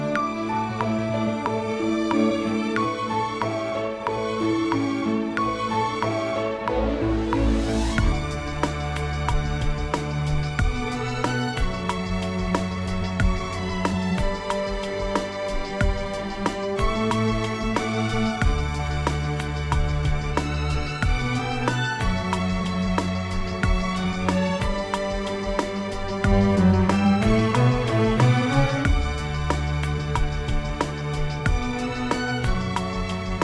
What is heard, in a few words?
harmony test backing track